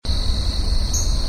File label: Dawn Cycle